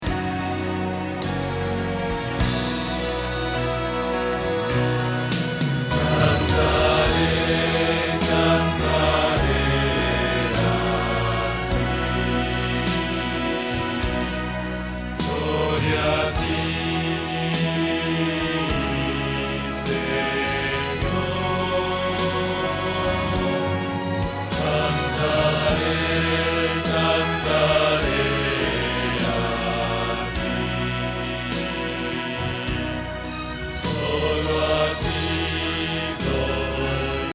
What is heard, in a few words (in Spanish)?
Bajo